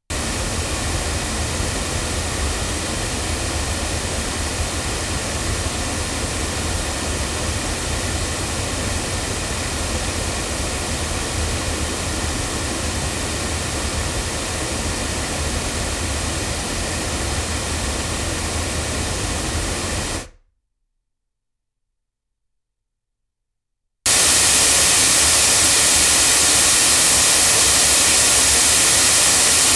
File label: MXL 1006BP battery loudspeakers